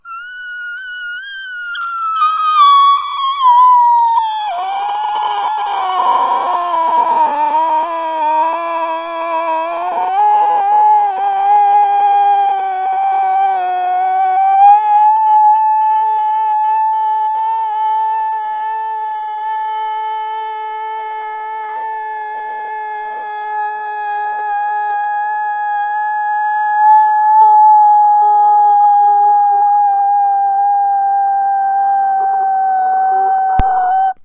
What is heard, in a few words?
dying dolphin